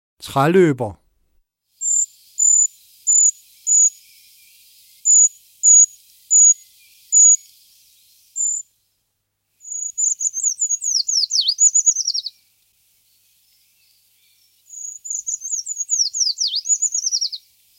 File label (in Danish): Tralober, fugle stemmer